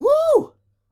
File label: wooo